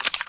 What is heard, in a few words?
glock clip out, weapons; cstrike; csgo